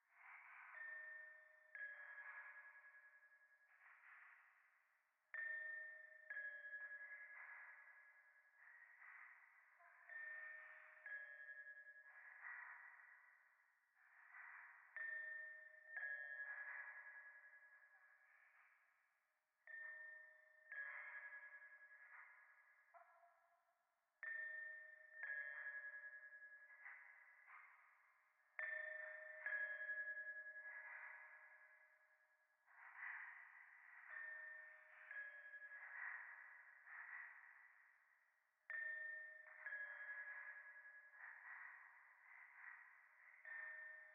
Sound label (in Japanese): 廃墟 左耳密着吐息 神社